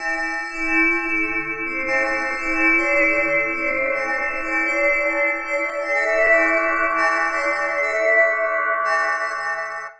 Venera Melodic, Washes; Sonic Foundry (Sony Creative Software); Myths of Technology

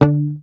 Bass, MUTES; Sampleheads; Will Lee Bass Library; HAMER12

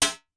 CLAP, Vermona Mono15 Drums

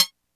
Perc Comb, Korg Radias

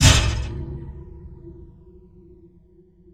STOVE HIT; Rarefaction; Poke in The Ear With A Sharp Stick

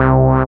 SYN 202, Roland MC-202

